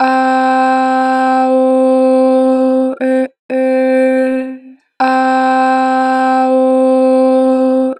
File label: Ah Oh Eh, Vocals; Harmor Vocals; 4 bar flat; Pitch Flat